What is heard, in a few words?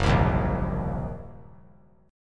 Gb 6th Flat 5th Gb down; strummed; Gb6b5; JGuitar